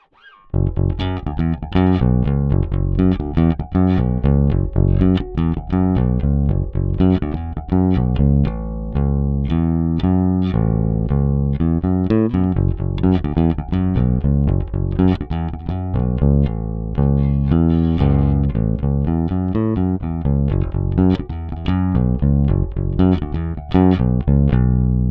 dimarzio will power; yamaha attitude

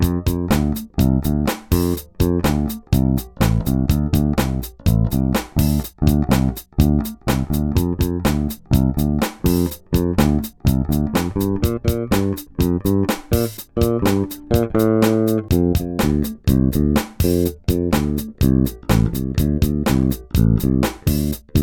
Warwick Thumb 6 NT active; Hals-/beide/Steg-Pickup (aktiv)

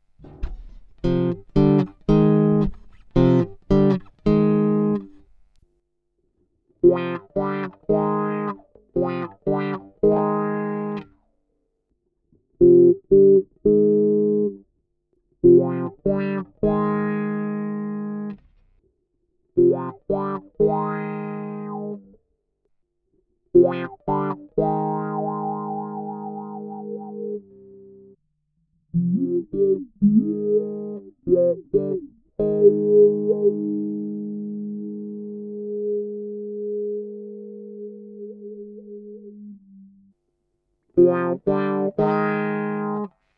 wd7, ibanez

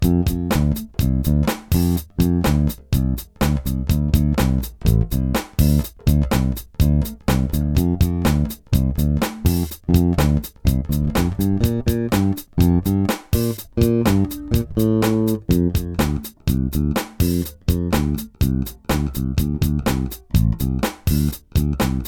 yamaha jp2

